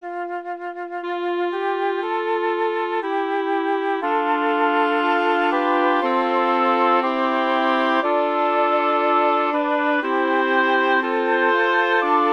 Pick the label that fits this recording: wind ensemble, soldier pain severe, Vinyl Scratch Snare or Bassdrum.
wind ensemble